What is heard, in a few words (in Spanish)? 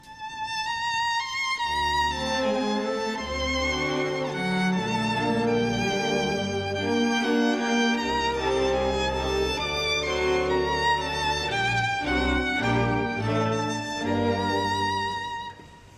Familia cuerdas